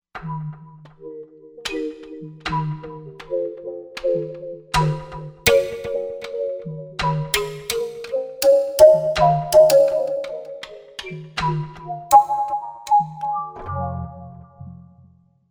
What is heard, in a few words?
AirPluck, Roland Fantom XR